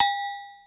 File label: Agogo, Ensoniq ASR-X Pro